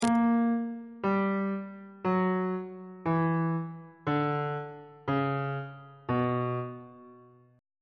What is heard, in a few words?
c flat harmonic minor scale descending on bass clef